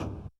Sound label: flaps hydro end, aircraft; DCS